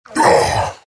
morph pain; terrorblade

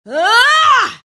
grunt; dota; legion commander

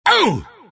pain; dota; omniknight

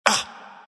embr pain; dota; ember spirit

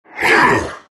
grunt; terrorblade

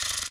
flutter; npc; courier; drodo

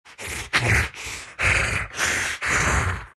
big snarl; Undying

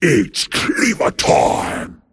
attack pain; dota; pudge

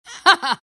lina laugh; dota

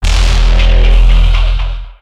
sunder target; terrorblade

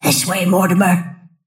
move pain; snapfire

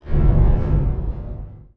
trap cast; templar assassin

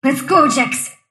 sylph move pain; dota; dark willow

